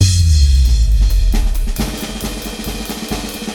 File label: Music transition; Music Game General